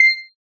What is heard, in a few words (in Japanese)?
ピン